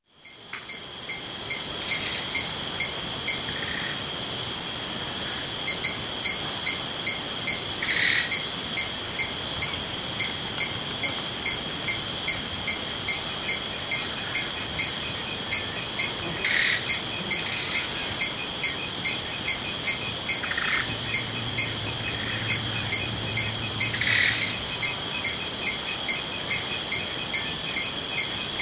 Amazon Jungle Ambience Bird Calls Medium & Distant; Nature Ambiences